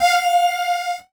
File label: Beep Long